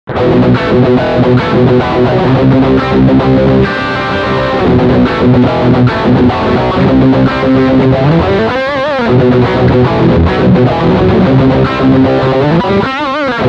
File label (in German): Riff Steg humbucker